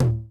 tom, Roland MC-909

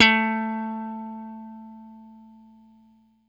blue pop, guitar; Quantum Leap; 90's Sample CDs

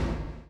CHAIN; Spectrasonics - Backbeat

